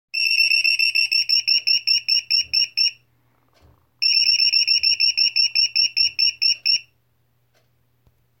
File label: chirp